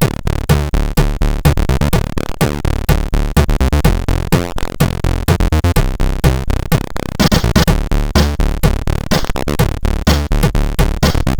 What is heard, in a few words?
sid CSG8580R5